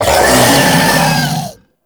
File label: Infested Death Vocal, Enemies; Warframe; Femur